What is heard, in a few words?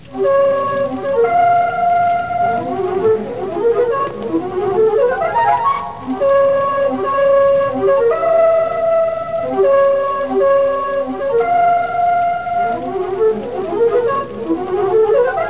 flute music; amiboshi